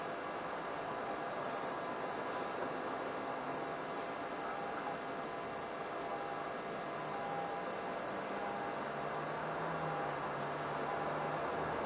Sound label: toilet siren